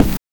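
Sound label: BRK BD, bass drum; NES DPCM; MIDINES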